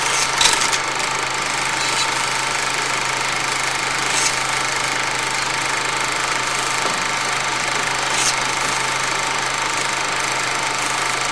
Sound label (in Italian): proiettore 16mm; Tono di notifica; per Cellulari Android o Iphone